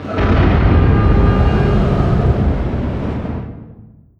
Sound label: distance super heavy battleship damaged defend; Hearts of Iron IV Death or Dishonor